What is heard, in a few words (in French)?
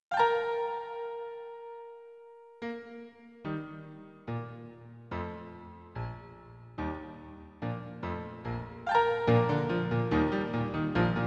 pour pianos